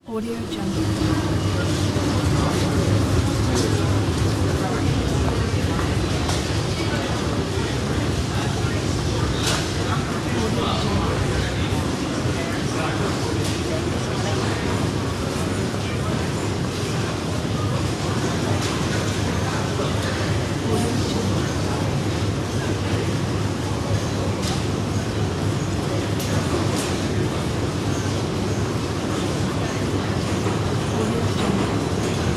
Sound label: metro